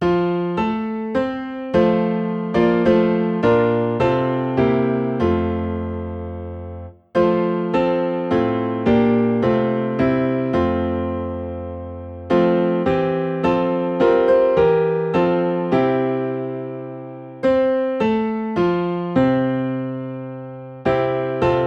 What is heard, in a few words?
Piano in F